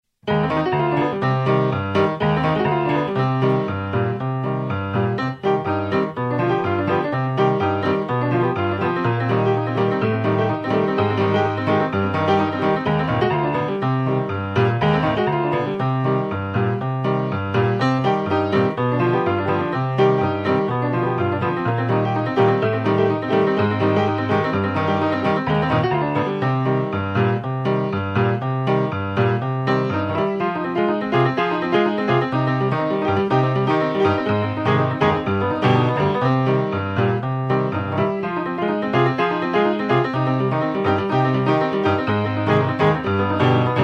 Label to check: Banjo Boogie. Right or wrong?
Right